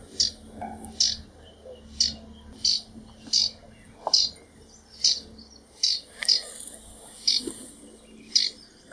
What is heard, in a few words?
Himalayan Bulbul, Nepal; Sarangkot Gandaki Zone Western Region